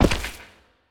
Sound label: movement step; smite; god odin